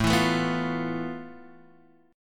F Major 7th Flat 5th A, guitar chord

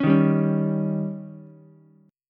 D sharp 7th D sharp, Ukulele; strummed; JGuitar

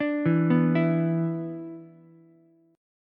B-Minor Double Flat 5th, Ukulele; Bmbb5 arpeggiated; JGuitar

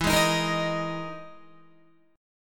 E-Major Sharp 11th E, Guitar

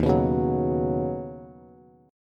Eb Minor 7th Sharp 5th Eb Bass; strummed

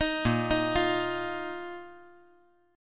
A sharp Suspended 4th

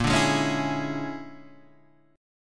Bb 7th Sharp 9th Flat 5th Bb Guitar DADGAD, chord; Bb7#9b5 strummed; 8,5,6,6,7,6; JGuitar

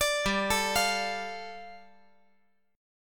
G 7th Suspended 2nd

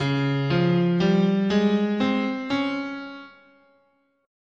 C sharp Chinese, scale

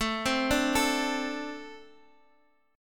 A Minor Double Flat 5th, down, Guitar, Open D

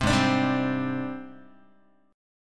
G sharp Major 7th Flat 5th Guitar; 3,4,5,5,4,x; JGuitar

